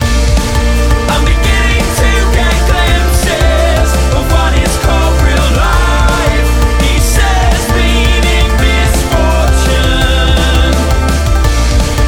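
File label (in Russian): мелодичный припев почти попсовый очень агрессивная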